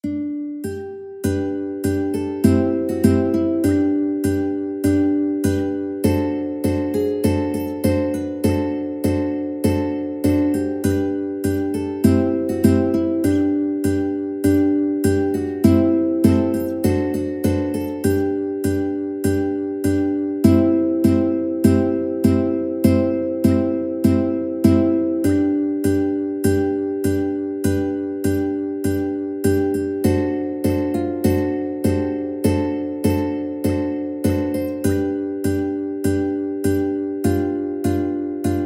aloha Oe, ukulele duet

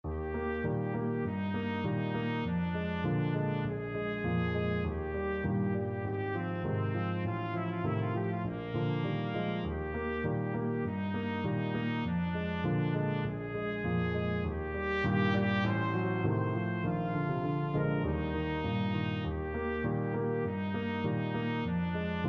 andante, trumpet; schubert andante

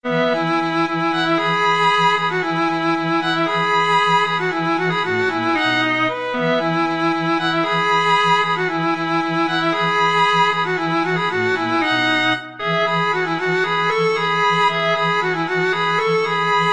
string trio

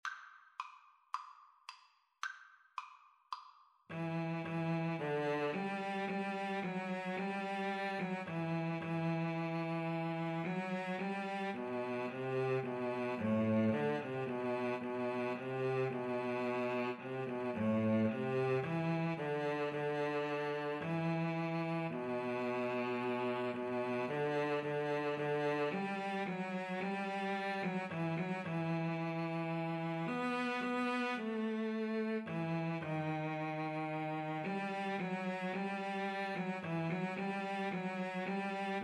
Cello Duet

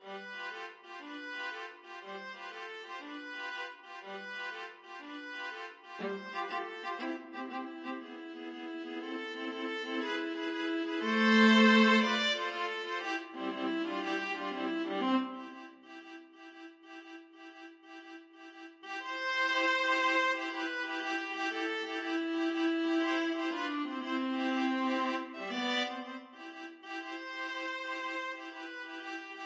funiculi viola trio

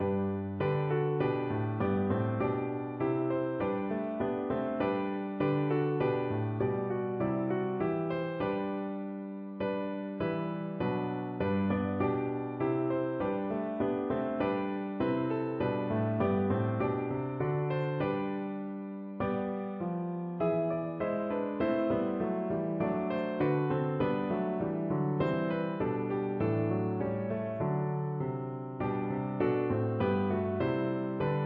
piano

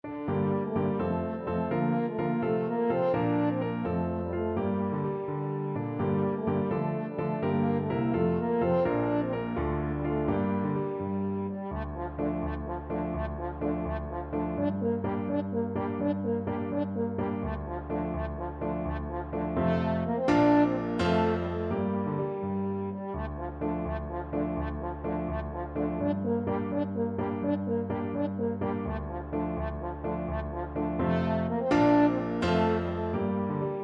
black nag, french horn; playford

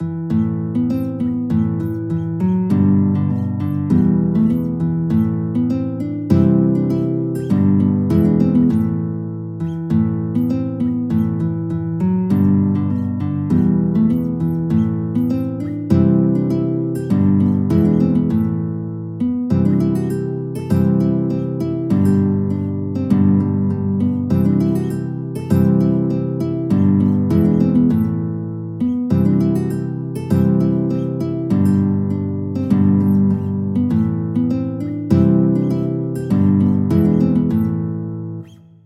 The Witches Hill guitar

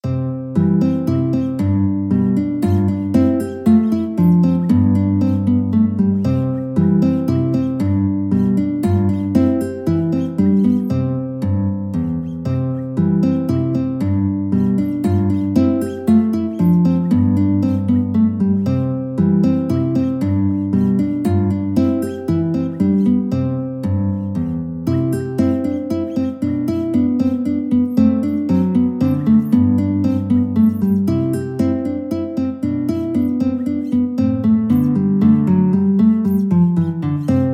minuet, guitar

